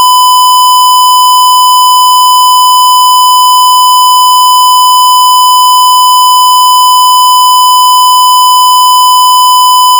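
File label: square 1kHz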